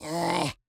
ア゙ー

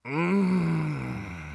んん〜

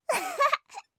アッハッハ

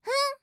ふん！

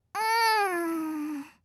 うーん…

